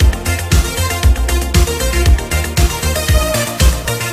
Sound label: loop; sven; jabdah